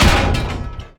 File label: impacts; World of Tanks